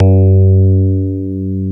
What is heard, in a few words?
KEY EP3 F 1, Digital EP's